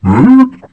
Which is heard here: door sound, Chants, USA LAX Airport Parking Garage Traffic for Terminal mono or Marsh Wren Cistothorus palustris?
door sound